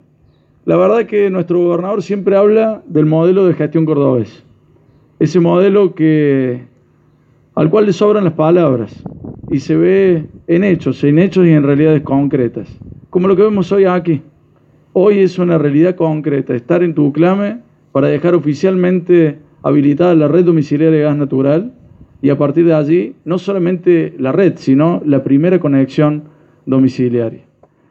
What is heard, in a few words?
gas